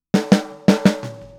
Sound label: Boogaloo Snare, 82 BPM; Sonic Foundry (Sony Creative Software); Drums from the Big Room The Mixes